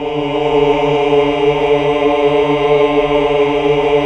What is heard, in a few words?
HAL AAH. AAAH Uni